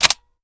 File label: reload empty mag in; gmod